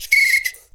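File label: foudang groove; Best Service; World Colours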